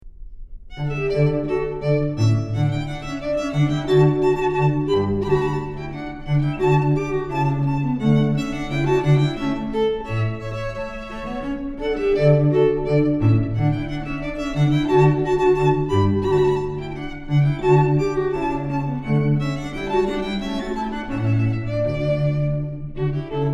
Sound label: Rondeau Trio